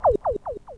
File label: logout; logoutnew